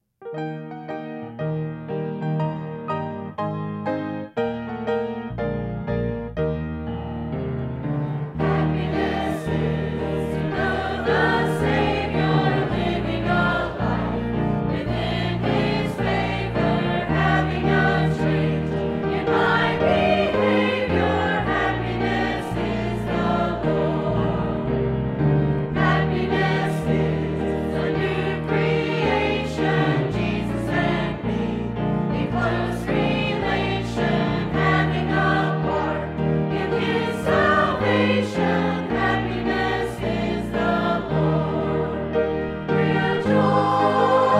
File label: Choir; calvaryministries